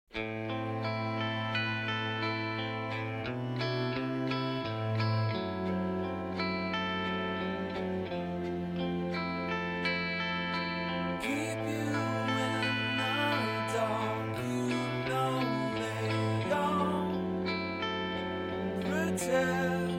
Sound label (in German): musik Test; Tinnitus Musik Therapie